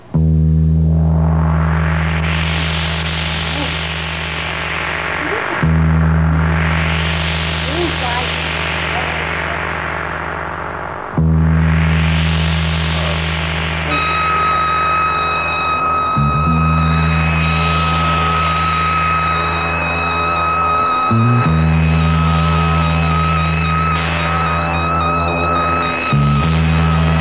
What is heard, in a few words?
Weirder Guitar Synth Jam